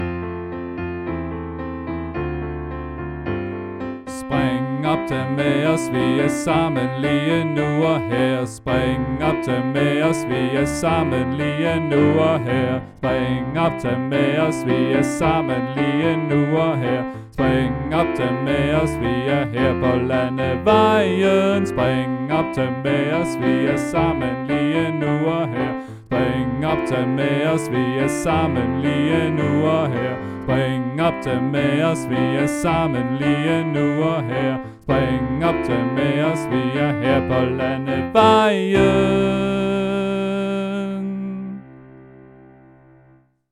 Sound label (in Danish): Alt 2; Spring op tag med; oevefiler